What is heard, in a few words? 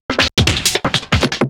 Break, Drum N Bass Percussion; Sonic Foundry (Sony Creative Software); Knutrix Drum & Bass; Fills n Breaks